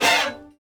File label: D string hit